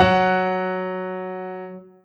Two F#3 F#5; osu

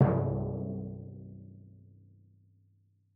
Drum Timpani C

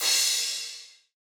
cymbal

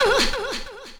UH wave; osu

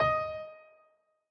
Piano

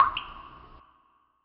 waterdrop

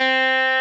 Arp.

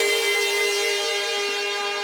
synth main

